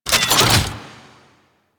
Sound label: Mech Omni Tool Equip; Warframe; Vehicles; NechroMech; Abilities